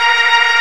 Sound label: Organ, MortStrg+WurlVox